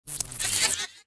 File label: bronze; Quantum Redshift